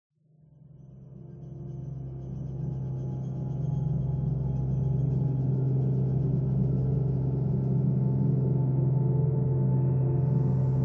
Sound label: Interior Drone